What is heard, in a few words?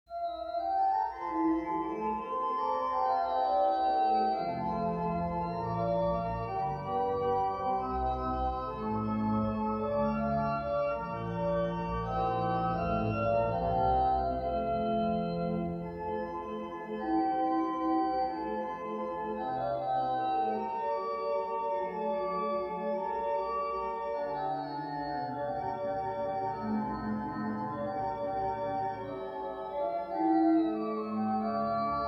Prelude in F Major; Bach; Little Prelude and Fugue in F Major BWV 556